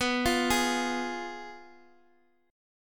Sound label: Ab Minor 6th B